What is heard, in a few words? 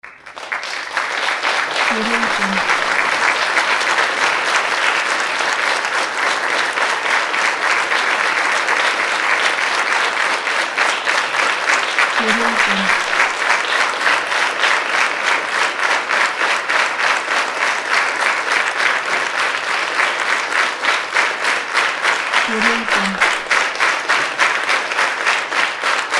theatre applause large crowd